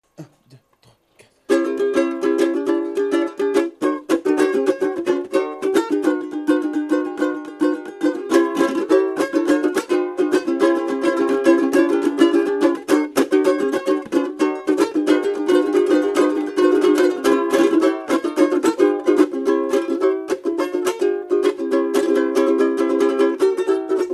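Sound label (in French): comparatif flamingo (left) islander (right), Un comparatif de deux ukulélés historiques en plastique; Flamingo vs Islander : deux ukulélés historiques en plastique; Un comparatif de deux ukulélés historiques de l’après deuxième guerre mondiale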